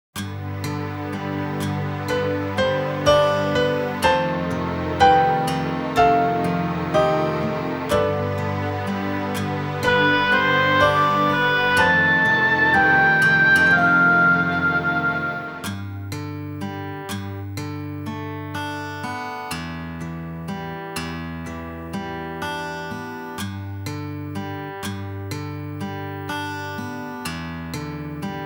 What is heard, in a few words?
Instrumental